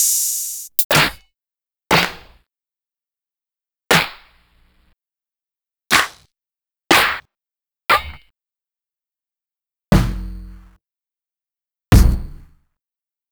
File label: Roland MV8800 DRM KIT; MA DURT KIT